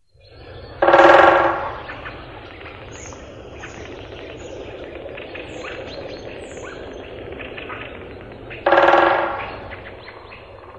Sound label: woodpecker